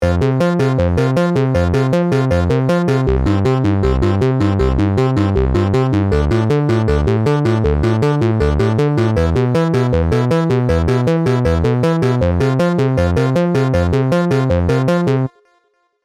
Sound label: Waverunner Terminal U